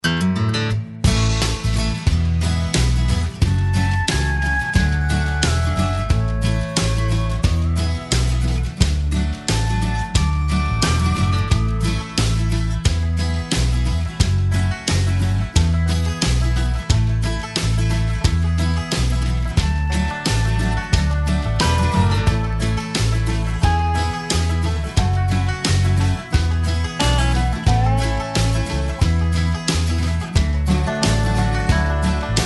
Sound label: country track